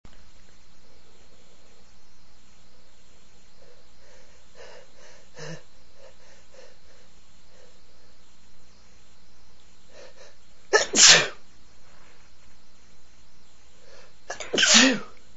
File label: breathy, male, sneezes